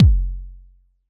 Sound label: kick drive; kicks